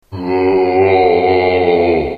zombie spawn

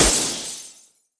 hit glass; weapons

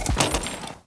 grenade hit; weapons

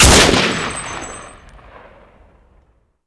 fg42 shoot; weapons

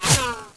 whizz; weapons

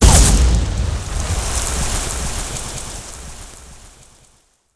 explode water; weapons

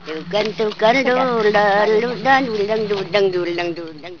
ewok song

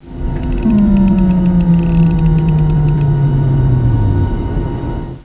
tractor beam

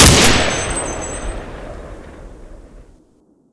bren shoot; weapons

